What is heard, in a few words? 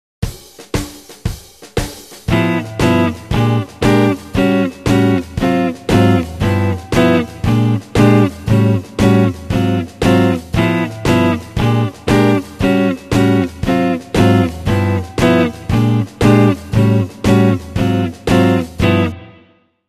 Rhythm Guitar